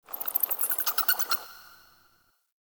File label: Critter Random; Warframe; Ambience; Nokko Colony